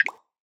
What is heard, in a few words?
Item Click